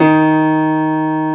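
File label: reverse D#2, piano; AKAI S-Series CD-ROM Sound Library VOL-2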